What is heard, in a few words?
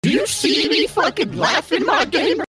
laughing